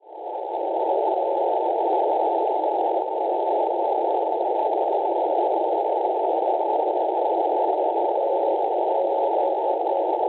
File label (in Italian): NDB, Moraska Trebova Repubblica Ceca 492 kHz; TBV; Schwarzsee